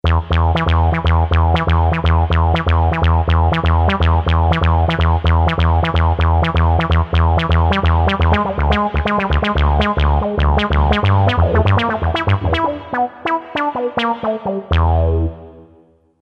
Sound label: Acid Bass, Yamaha PSR-550 XG sounds